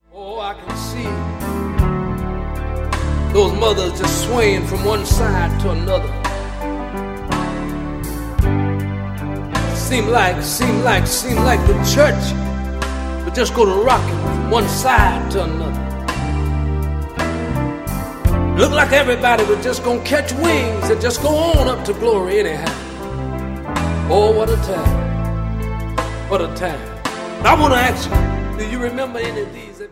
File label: Old Time Hymns, gospel